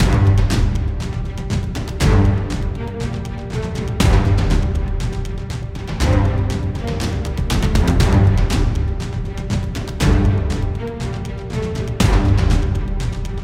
Battle

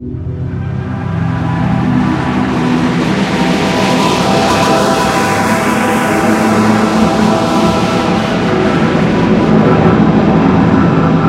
Glitch Ambiance; There Is No Game; Jam Edition 2015

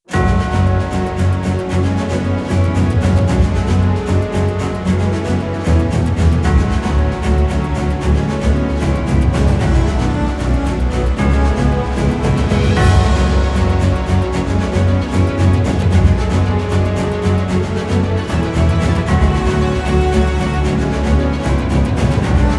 BGM Battle; aster tatariqus android ios mobile gamerip 2023

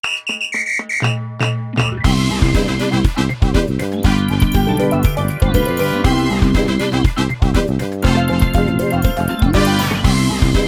Dance Loop; Happiness Charge Precure! Kawa Run Collection

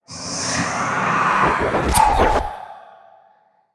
Power Shot; we are the dwarves gamerip 2016

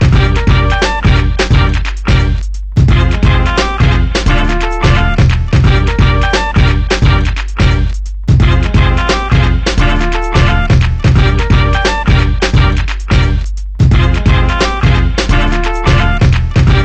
Loading Song Loop

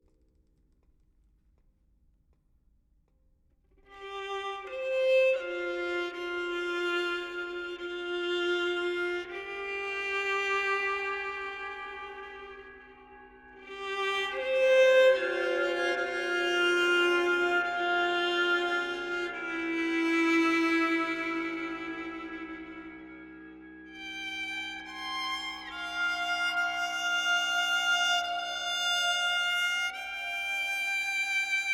Violin; Get Even; Ge Theme Violin Asylum 01 Part 02

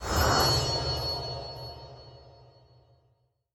near object; Scrat

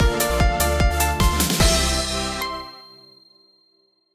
Big Success; minecraft 15th anniversary party

